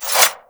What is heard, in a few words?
Unequip Dual Daggers; Warframe